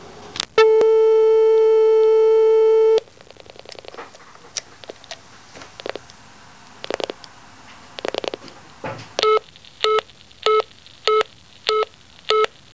besetzt; 999wavs

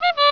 HUPE; DRAGO

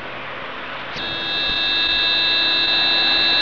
AMPEL; 999wavs

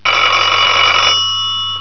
WECKER

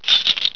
BESTECK; 999wavs

